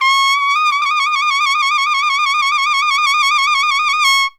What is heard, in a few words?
T C# LNS, TRUMPET FX; Zero-G; Phantom Horns